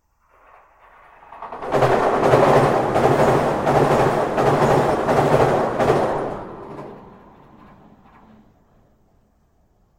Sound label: railbridge